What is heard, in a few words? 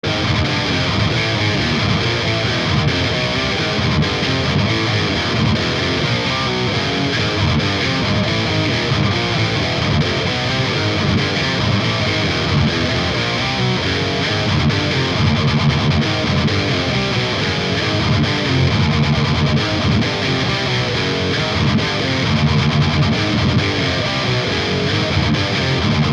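guitar axe; savage120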